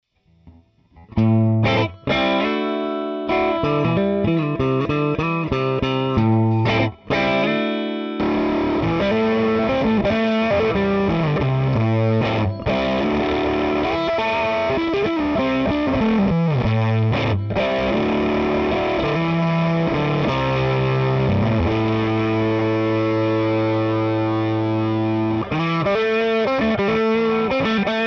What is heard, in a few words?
GT402J p416b Fuzz; Fuzz Tone List